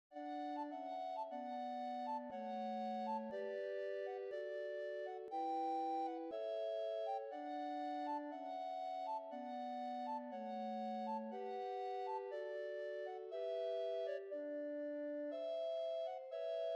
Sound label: Bamboo prelude